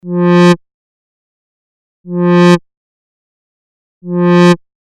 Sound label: ADSR